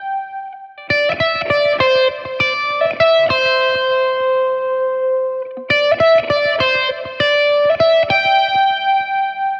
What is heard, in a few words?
Charisma Lead Guit, metal; Метал